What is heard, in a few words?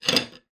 household plate movement on table